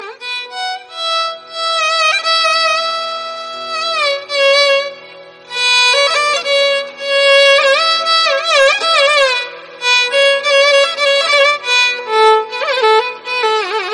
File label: violin carnatic; athana